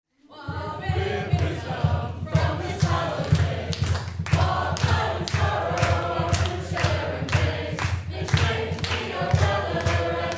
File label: chant; pirate